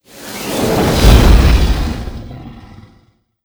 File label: boss dragon deth